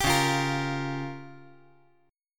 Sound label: Db 6th Flat 5th Db down; Db6b5 strummed; JGuitar